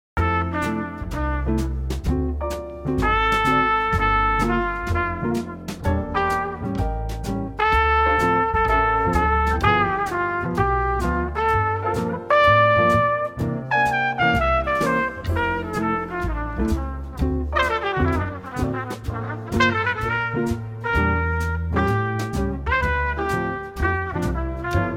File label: Jazz